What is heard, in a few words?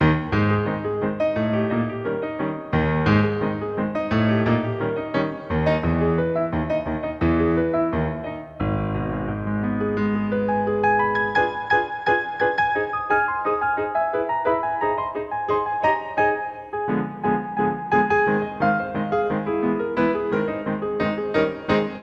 Maple mono